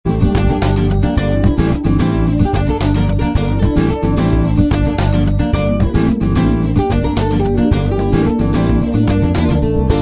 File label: Spanish Nights